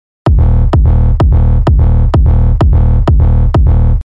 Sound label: Big Room Kick